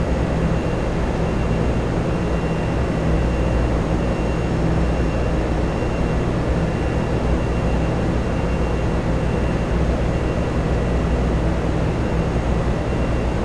industrial hum; gesource

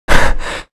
gasp; gesource; player

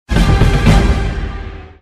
token drop enemy; gesource; player

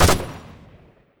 fire npc; gesource; weapons; klobb

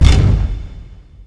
stone stop, doors; gesource

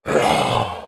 zombie, infected; gesource

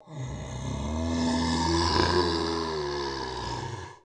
zombie, infected; gesource

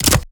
attach, mines; gesource

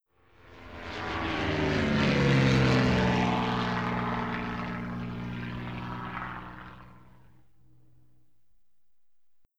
propeller airplane flyby; gesource; vehicles

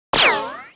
ricochet; gesource; physics; impacts; ricochets

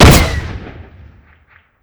rocket launcher fire; gesource; weapons

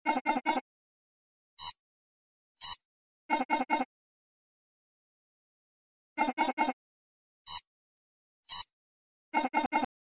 beeping ambient; gesource

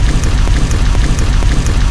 stone slide, doors; gesource

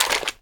ammo pickup, items; gesource

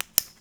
flashlight, items; gesource

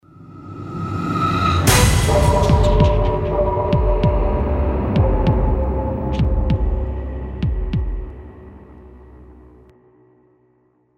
gameover; gesource; player

